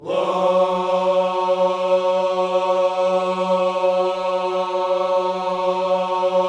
WHOA F#3, Vocal Planet Gospel; Spectrasonics; Vocal Planet Vol.1 Gospel; akai

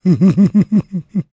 PREACHIN, laughs, jazz

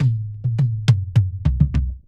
ROLLS; E-Lab; X Static Goldmine 2

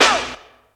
PERC; ZERO-G; Datafile

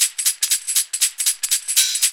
LOOP; E-Lab; X Static Goldmine 2; akai

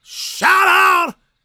GOSP MALE, Vocal Planet Gospel

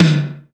TOM

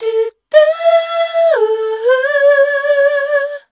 MELODIC A, Voice

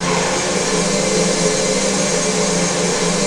ATMOPAD; Best Service; XX Large; Pads

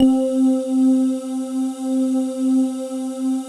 PAD, ATMO PADS; Best Service; XX Large

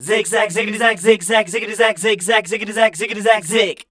SCAT, Voice Spectral

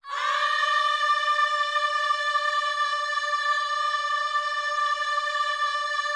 OHS D 5, Gospel; Spectrasonics; Vocal Planet Vol.1 Gospel; akai

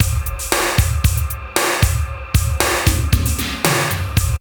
BREAK

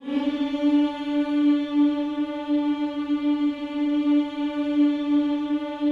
HMMS D 4, Vocal Planet Gospel; Spectrasonics; Vocal Planet Vol.1 Gospel; akai

